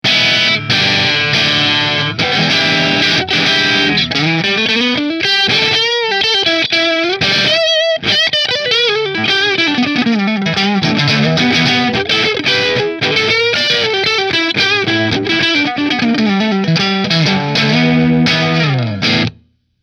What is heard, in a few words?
Nola Voodoo Neck Split Marshall